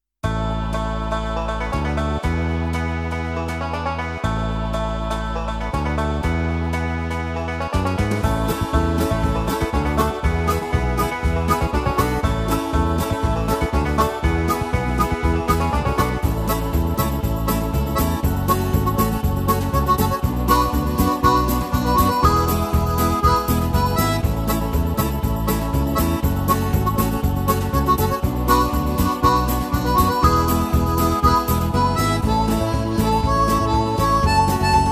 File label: country